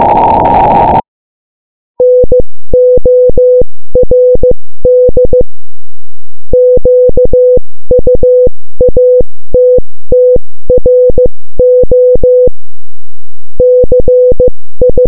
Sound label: morse